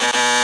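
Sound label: Se Field Quiz Ng; New The Denpa Men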